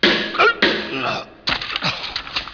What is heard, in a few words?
shots